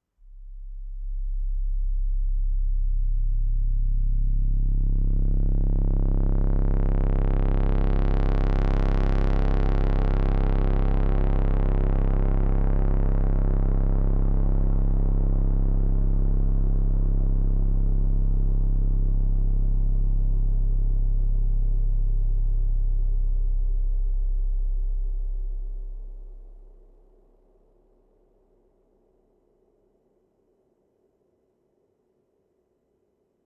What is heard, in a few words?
C Note Drone Sweep Reverb; Loki